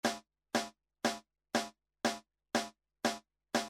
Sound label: Snare clean